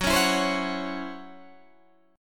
C sharp Minor Major 7th Double Flat 5th F sharp, guitar chord